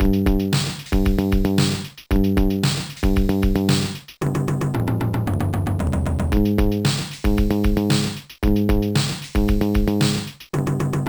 heavy; Sonix Music Driver

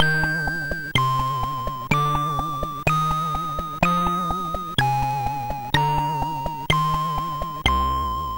sid MOS6581R2; Alien 8

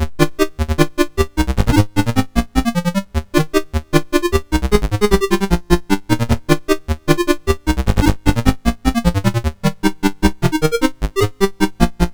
sid MOS6581R4; Movie Monster Game

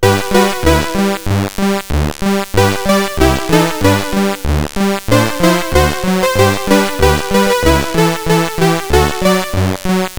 Jingle Bells, CSG8580; CSG8580R5

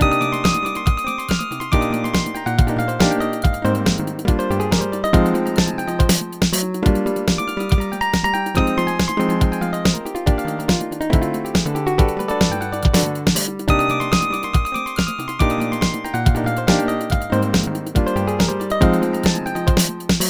solo; Dr. T's MIDI Recording Studio

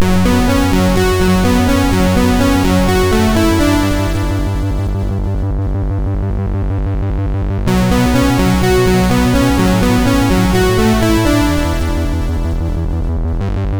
sid MOS6581R2

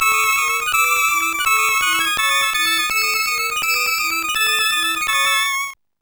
village; Sonix Music Driver

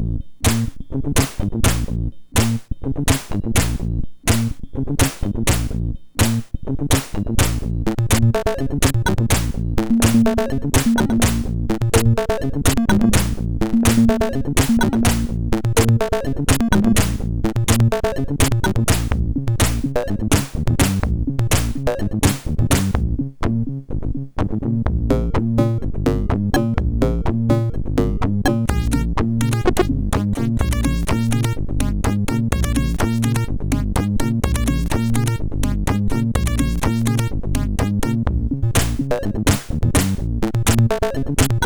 Funky, MOS6581R4; Funky Mother; Muhmi

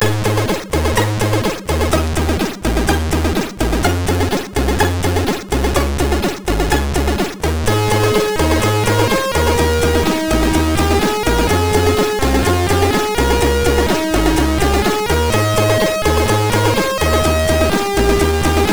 Music Box tune, MOS6581; sid; MOS6581R3

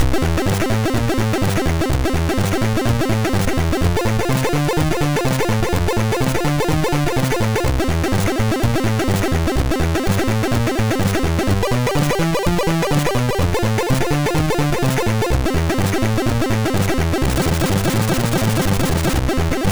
Jazzy Tune, MOS6581R2; sid

